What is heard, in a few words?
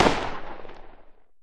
explosion smoke far